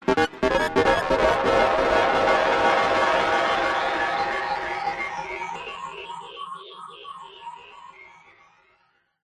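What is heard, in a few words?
synth space weird synthy sci fi melody glitchy bleeps; Jessey Drake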